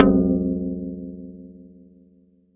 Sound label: Tom